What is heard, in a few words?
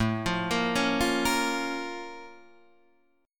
Bb Minor A; Bbm/A; arpeggiated; JGuitar